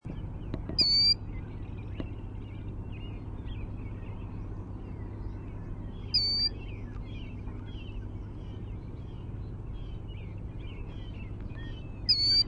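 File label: Killdeer dee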